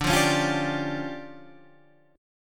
D Minor Major 7th Double Flat 5th, D, Guitar Standard; DmM7bb5; strummed; JGuitar